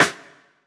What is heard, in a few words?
hiphop snare, Effect on